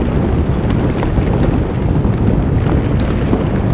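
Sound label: ambience lava flow loop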